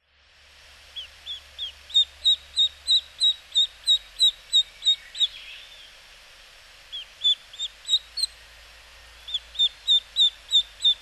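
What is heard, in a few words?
karugamo, Eastern Spot-billed Duck; hyogo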